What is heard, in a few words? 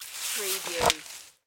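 Sand Pail Scoop Small; sound effects bible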